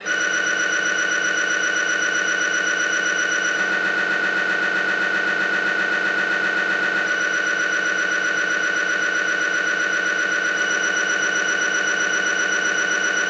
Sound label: siemens 3t magnetom prisma fit whole body human scanner mri